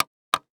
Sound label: Technology Electronic Machine Wood Record Player Button